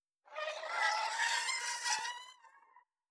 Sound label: Hydra Vo; Legend of Keepers